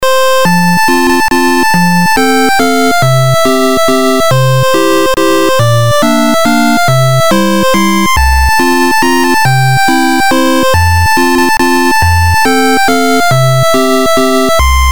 8bit waltz; kobune

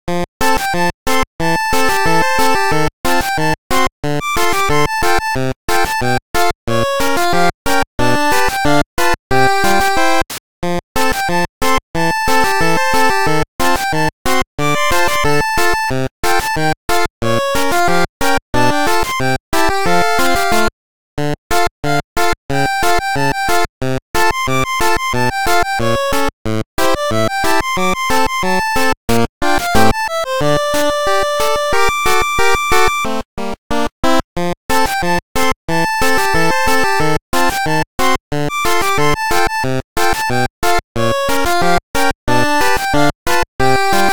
8bit Loop; yorozuya douchu

